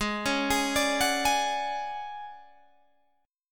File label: D Major 7th Flat 5th G sharp, chord